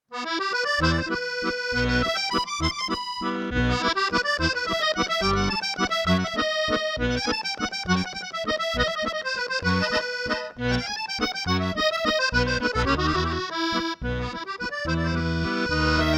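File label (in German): Musette, Harmonika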